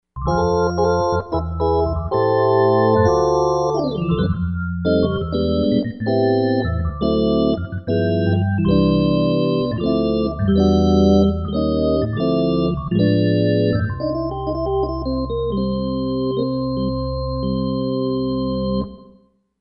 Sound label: Gospel Drawbars